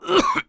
cough; Heretic II Demo

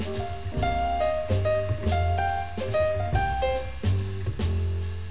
jazz; Blender Magazine volume1.3 1994; bar

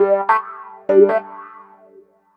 Wah Roads, Loops; Sonic Foundry ACID Music

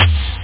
dooptom; OctaMED SoundStudio V1; drumkit

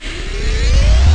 Armed; HangSim; beach

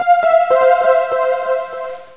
turn; MPG-Net (USA); imperium

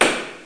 battle; batword

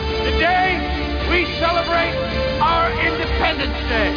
celebrate; independenceday-movie

